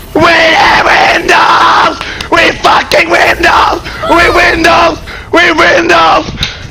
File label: windows loud